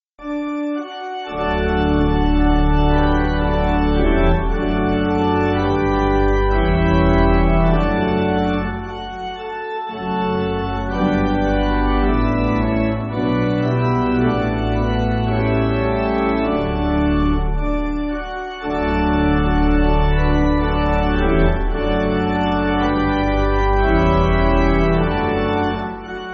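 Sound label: Pipe Organ, Music Backing; Christ Was Born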